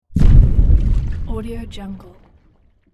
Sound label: underwater explosion; sound-create